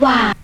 VOX SHORTS; Megabass Remix! 2

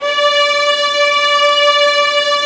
violins E, Synclavier Strings; ILIO

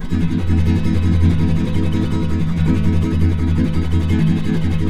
A# M, Guitars; Spectrasonics; Hans Zimmer Guitars VOL-2; FLAM TREM CH

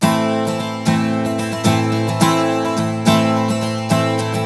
Blues Guitar Samples; ILIO; Fretworks; 108 NATL RIFFS

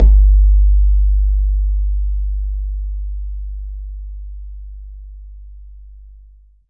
BAYAN; Q Up Arts; Denny Jaeger Private Collection

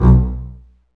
STR, Cb Bowed, Cb Marcato; Roland L-CD702

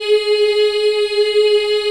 G#3 CHI IH-R, Choir; CHILD IHF

